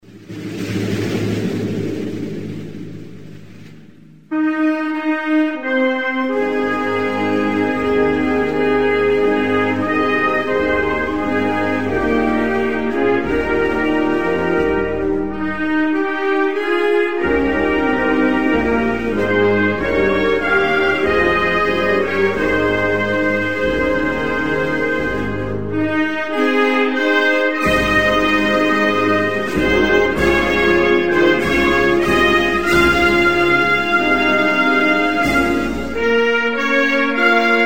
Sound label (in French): Malaisie, Hymnes nationaux